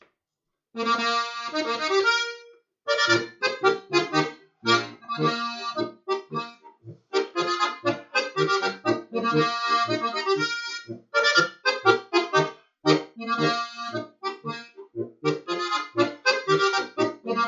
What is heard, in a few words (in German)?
Plattenpolka